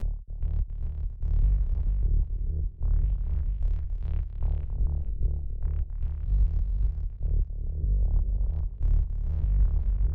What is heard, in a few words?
synthe saw bass effects